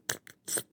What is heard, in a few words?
glue slurp